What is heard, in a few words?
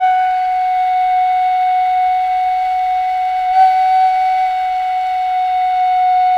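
khlui F#4, Thai flute; Spectrasonics; Heart of Asia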